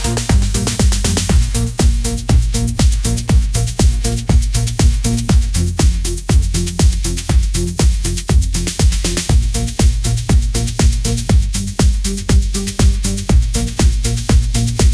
upbeat pop melody

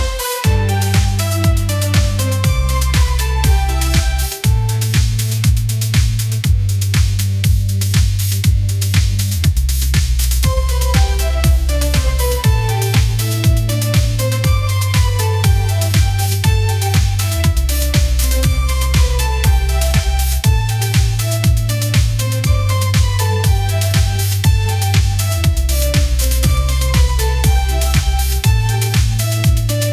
epic pop anthem

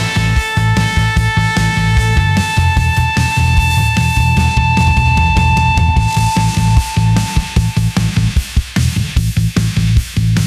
call waiting rock riff, ringtones

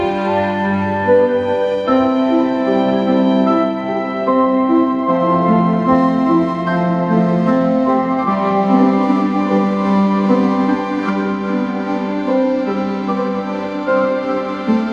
piano and strings

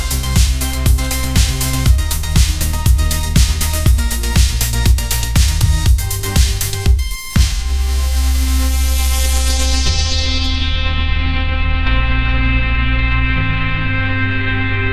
cyber edge pop anthem

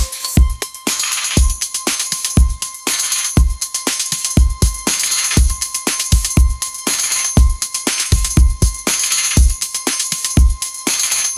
bright synth pop anthem; ai

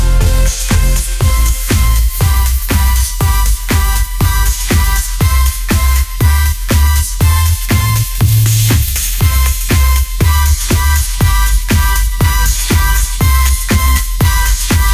shining moments pop groove, ringtones

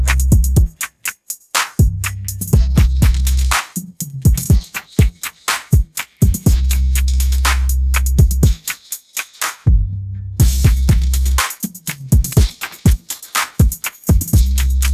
sunshine pop rap groove; ai

